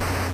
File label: explosion, tanks